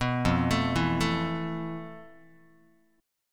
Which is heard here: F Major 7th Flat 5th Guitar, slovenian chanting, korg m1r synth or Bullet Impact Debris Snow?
F Major 7th Flat 5th Guitar